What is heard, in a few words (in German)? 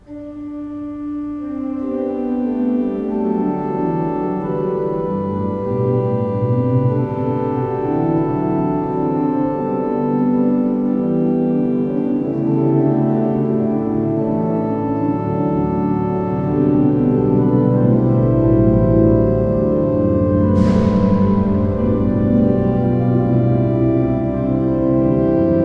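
Brandt, pfeifenorgeln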